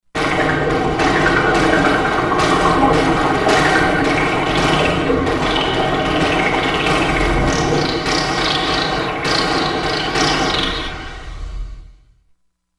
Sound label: SFX, Korg MSC-16S; Environment